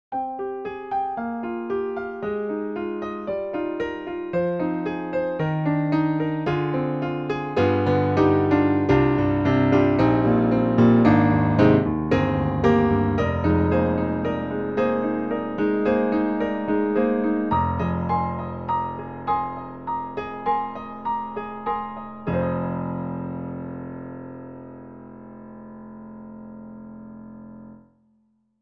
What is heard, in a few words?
Dark Grand; Steinberg; HALion Sonic